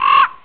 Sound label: Raven; If Monks had Macs; MacCube Volume 5: Home Applications